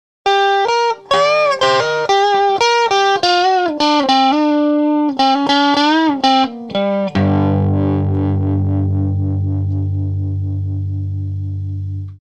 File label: amp head dirty leak tremolo tail; dr zee se cdc amp head